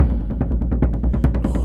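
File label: Music Shield; from dust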